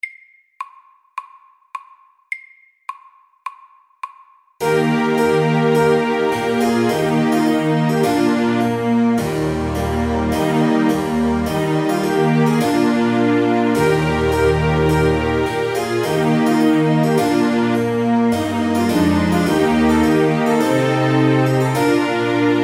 Trumpet tune SAKTE

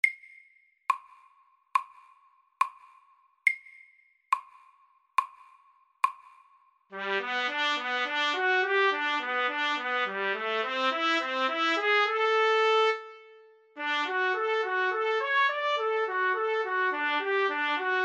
Etyde i A dur MIDDELS

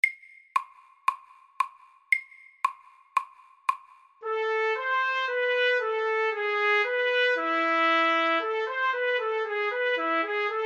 Etyde i H moll MIDDELS

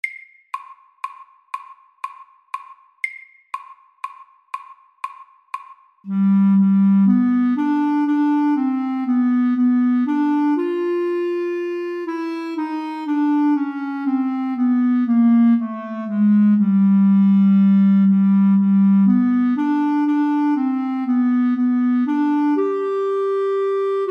Kromatisk etyde SAKTE

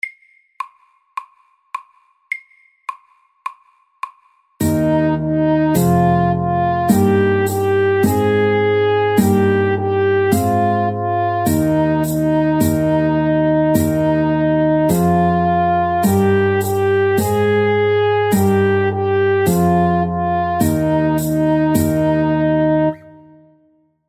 Folketone MIDDELS

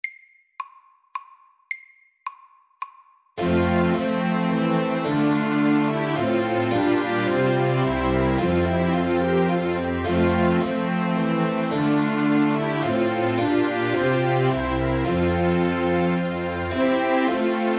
Menuett SAKTE

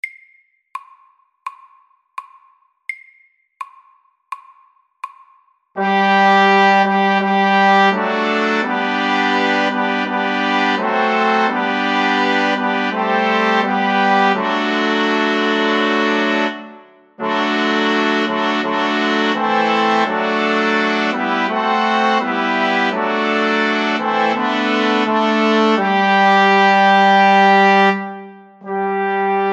Fanfare for tre SAKTE

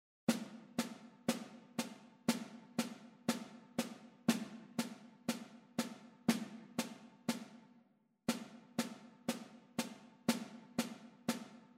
C moll natura SAKTE

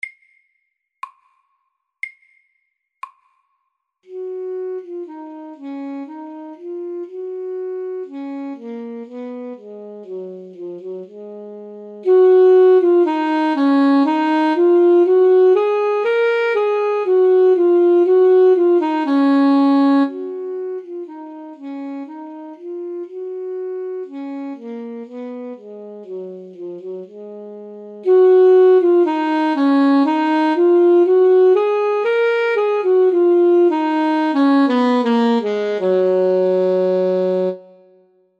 Dynamikk etyde SAKTE

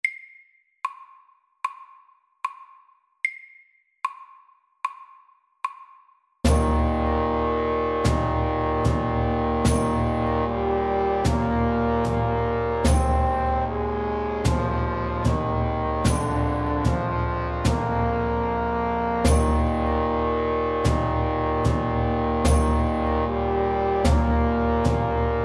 engelsk melodi SLOW 75

